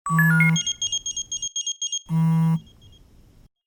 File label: ringtone